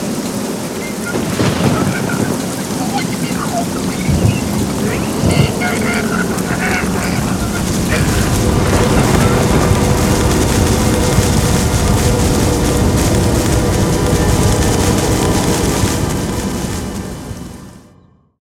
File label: Sounds Cinematics, Intro